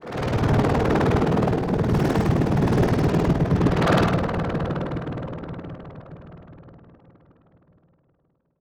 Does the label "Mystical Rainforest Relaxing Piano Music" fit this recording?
No